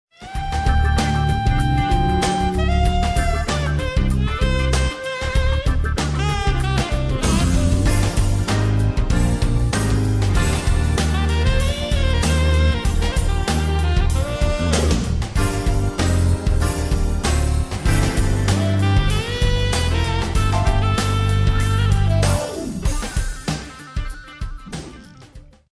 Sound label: jazz funk